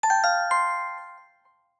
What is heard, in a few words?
Sweet, notifications; Vivo X3S-W